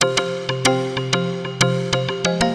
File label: Synt; FUNKIN&GROOVIN'